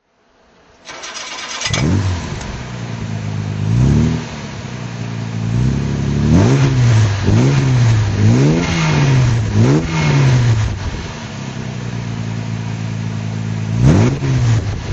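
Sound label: jag etype 3.8 throttle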